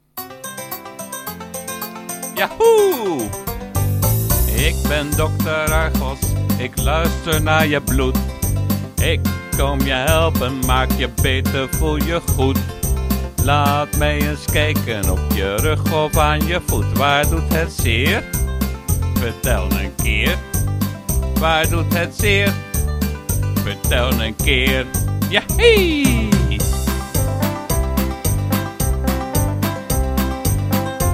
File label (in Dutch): dokterlied met zang